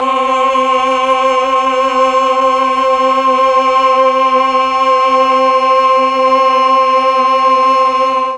C3 8VOICE CH, mellotron; 8VOICE CHOIR

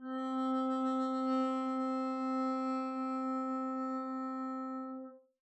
arco c4 pp down, double bass

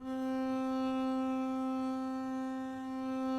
c4 down, arco looped; meatbass

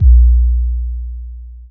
bd boom; drums; one-shots; kick

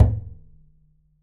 perc, pizz; meatbass

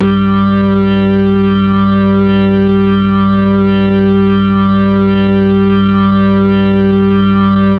G1 organ, mellotron MKII organ

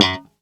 gb3 staccato, glowly bass

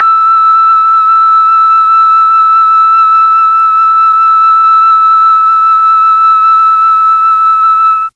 E4 mellotron FLUTE

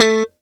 a4 staccato, glowly bass

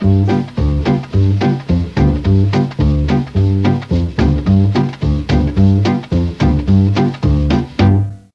B2 SAX, mellotron; FOXTROT+SAX

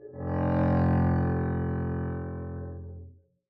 arco f1 mf down, double bass

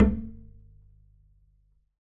perc, pizz; meatbass

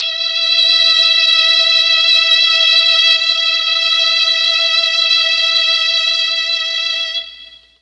E3 3 violins, mellotron MKII

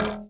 db2 release, glowlybass

